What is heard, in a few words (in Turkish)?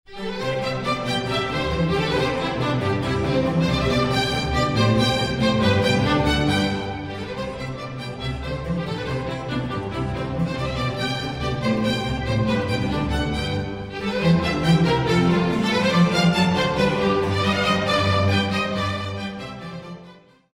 santral fon muzikleri